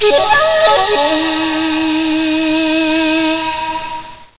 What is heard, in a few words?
sledge flute; musical